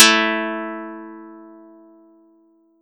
Audacity pluck

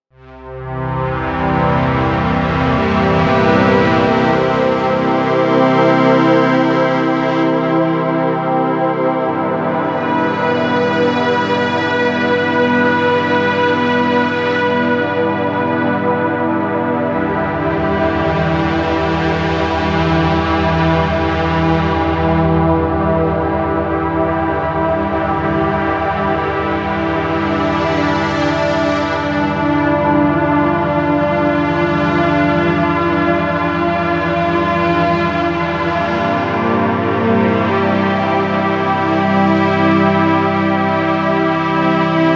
jam synth One pad; 4567 cross Set; VKAnalyzer

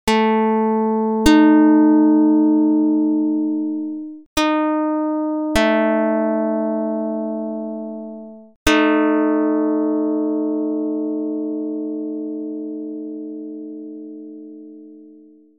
pluck

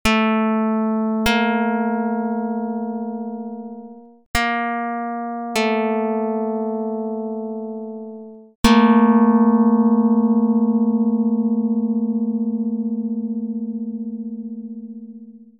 pluck 220; Jid 15 14; dr220

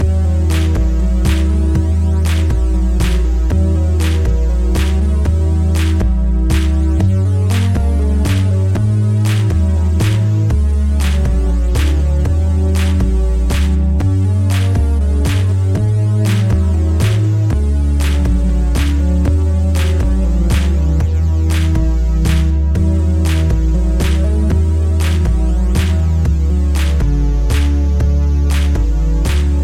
bagpipe; Yugo; Ajde Jano

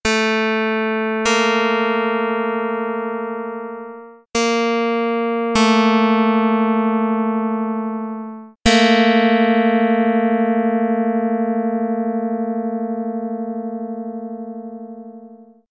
pluck; Jid 135 128; dr220

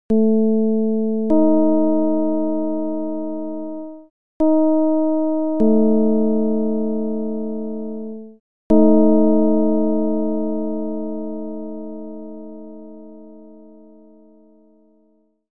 csound foscil 220hz

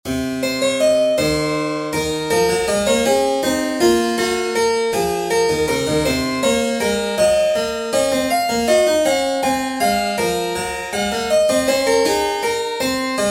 Diasem Locrian Example 41edo

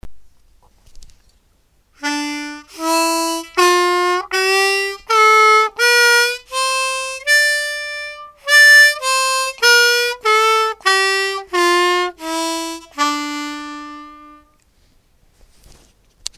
Harmonica; Nairuz

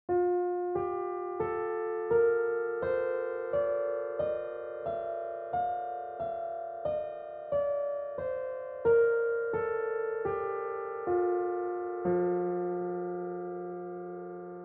13 edo 8 tone mode

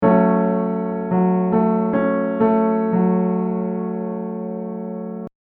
Minor triad

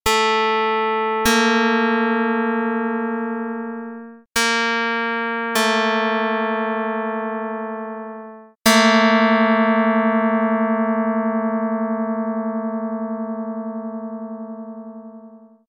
pluck; dr220

